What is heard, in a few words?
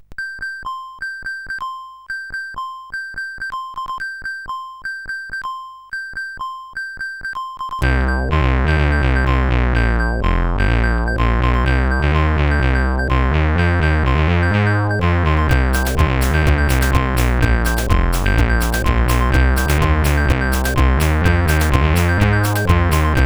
Acid, CSG8580R5